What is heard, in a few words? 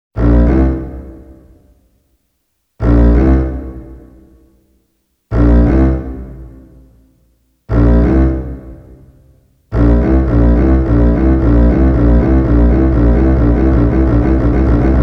Shark Attack; Soundzabound Volume 3 Disc 2